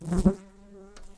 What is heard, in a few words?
Humilis workers, bumblebees